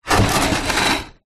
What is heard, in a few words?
household ceramic fruit bowl movement on table